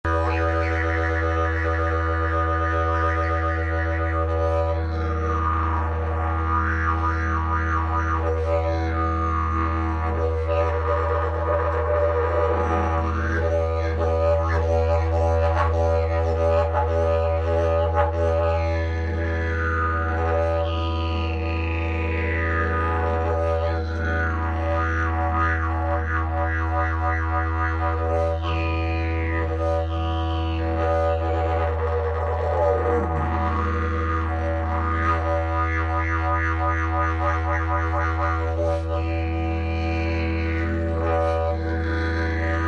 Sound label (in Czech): jilm, didgeridoo